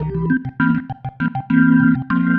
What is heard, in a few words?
Pattern, Organ